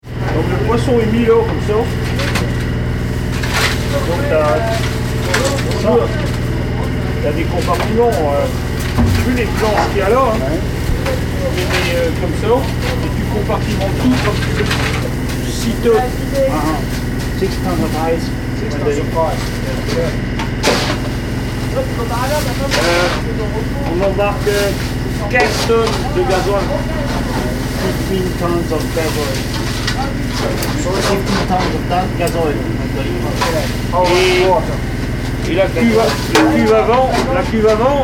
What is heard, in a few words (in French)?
cale; lesconil